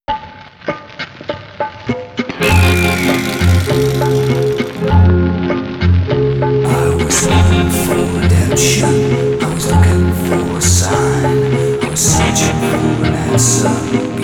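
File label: Music Vulture Jingle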